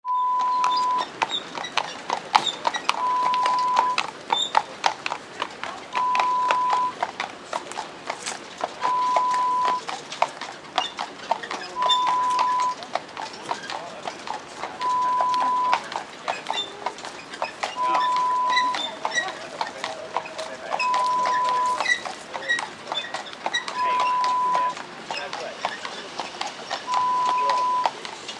Horse Carriage sound

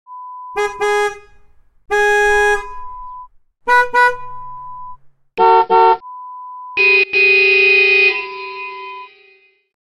Car Horn sounds

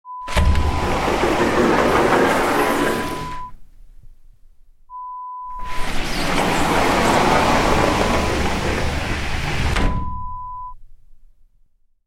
Sliding door

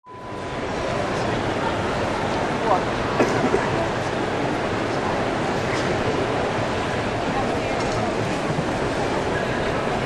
Crowd; Royal Albert Hall; Lo-Fi

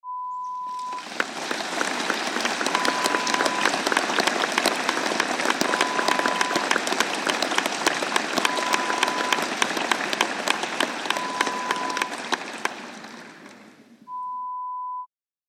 Applause Large Hall

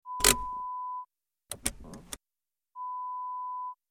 Car Handbrake

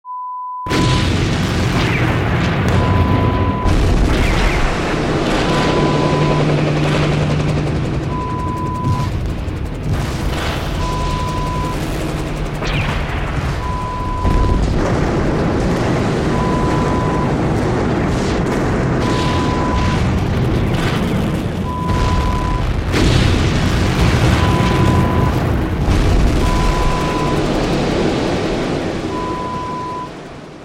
Battlefield sound

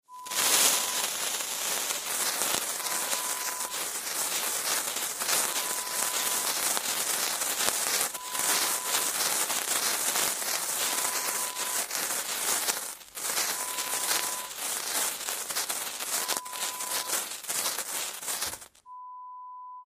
Sparkler Sound

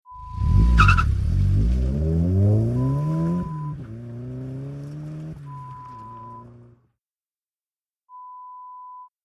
Car pull away

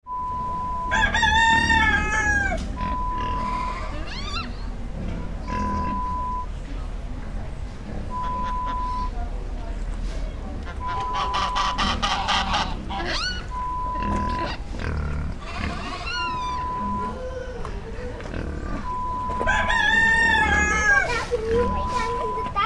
Animal farm sounds

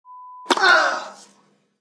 Tennis serve

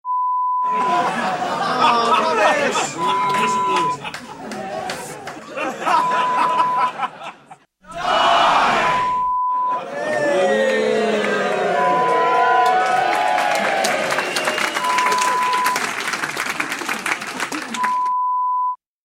Heckle sound

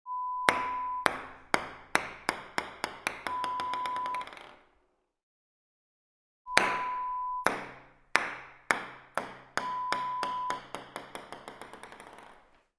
Ping Pong Ball Bounce

